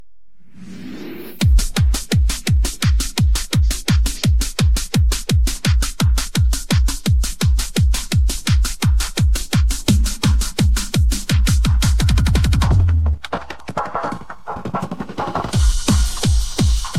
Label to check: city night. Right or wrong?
Wrong